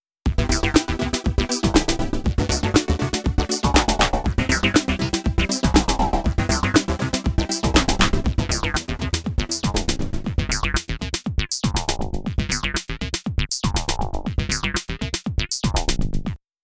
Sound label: Morse Coder Tech Loop